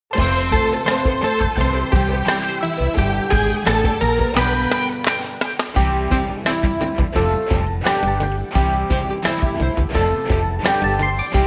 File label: Karaoke